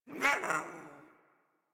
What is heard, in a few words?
Pet Speak; Warframe; Catbrow; Pet Ship